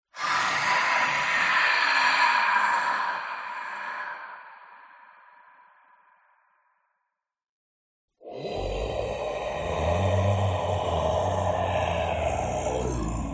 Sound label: Ghostly Breath Whoosh binaural; AMBEO; PMSFX